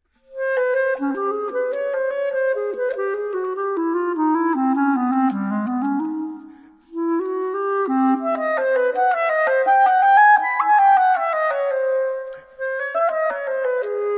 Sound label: jazz studies